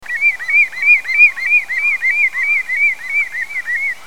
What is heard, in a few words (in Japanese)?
akaasisigi